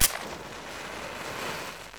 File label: match; best service Blue Box